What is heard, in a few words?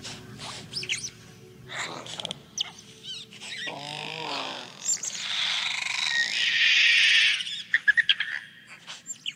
Dino Sounds COMPSOGNATHUS